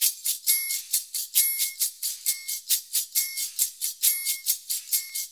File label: 90 MIX, Percussion Loops